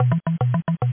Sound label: success; aceftp